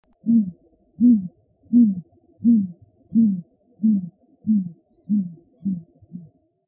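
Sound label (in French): lapone mâle, chouettes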